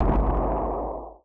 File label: Video Game Explosion; SFX